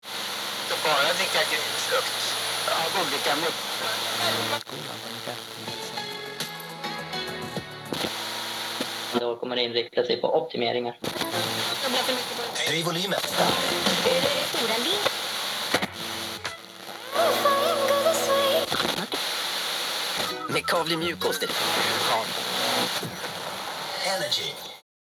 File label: Radio FM tuning vintage recording